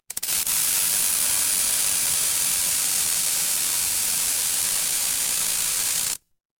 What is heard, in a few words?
fire electric spark